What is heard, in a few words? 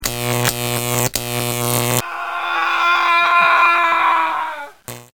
pain stick